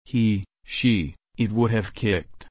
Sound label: kick